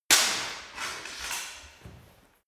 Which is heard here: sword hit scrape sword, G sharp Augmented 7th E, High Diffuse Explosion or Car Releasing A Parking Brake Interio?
sword hit scrape sword